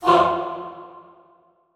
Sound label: FAH F2 R, Extended Classical Choir; Best Service